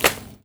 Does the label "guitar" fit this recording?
No